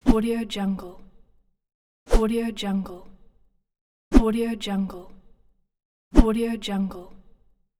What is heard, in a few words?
jump